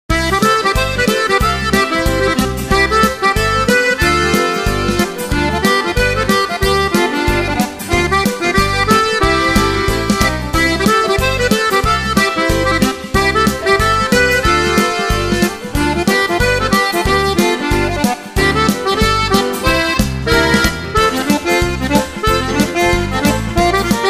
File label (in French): Accordeon Cajun